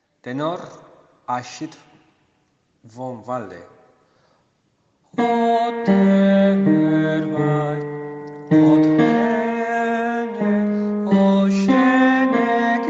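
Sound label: tenor; Abschied vom Walde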